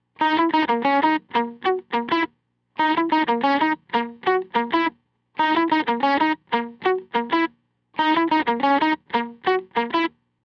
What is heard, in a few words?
distorted bridge; harmonics and distortion